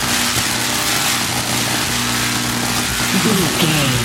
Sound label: electric sparks lightning loop